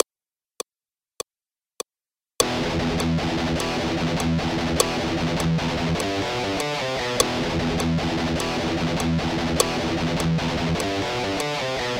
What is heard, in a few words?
Good Timing